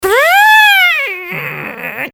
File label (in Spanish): friccion